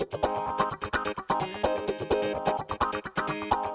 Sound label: Electric Guitar 128BPM G# maj; Vengeance Samples; VEH3 Electric Guitar Kit 2